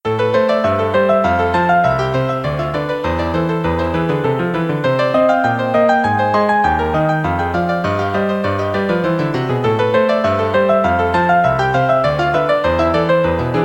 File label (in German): rumaenische taenze nr 3 2 klaviere